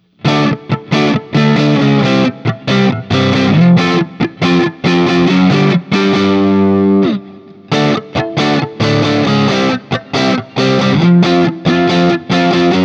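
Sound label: Guild Starfire I SC JCM800 SC A, guitar; Guild Newark St Starfire SC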